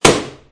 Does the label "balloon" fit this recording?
Yes